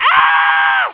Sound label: scream; OctaMED SoundStudio V1